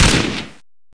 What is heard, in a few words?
fire 556mm